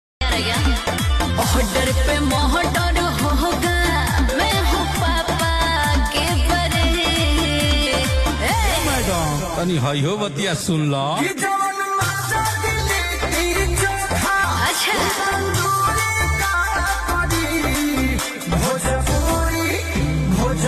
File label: Bhojpuri Ringtone